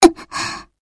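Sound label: attack; hibiki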